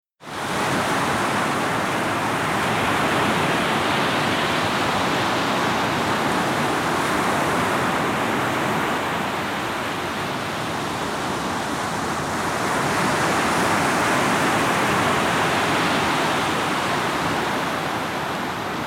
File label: New England Seashore